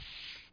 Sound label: slid out; Infobase